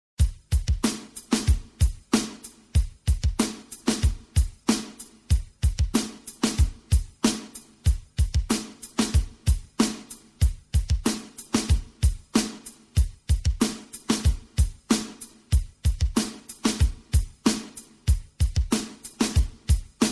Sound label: Ringtone for iPhone 7